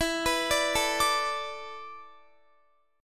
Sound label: E 7th Suspended 4th, Guitar; E7sus4; arpeggiated; x,19,21,19,21,21